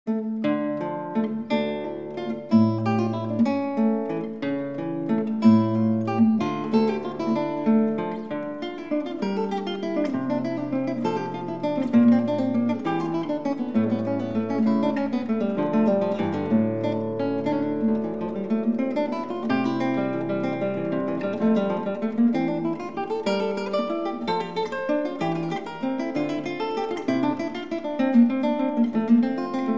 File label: Partita d-moll Giga; J.S. Bach; Partita Nr. 2; BWV 1004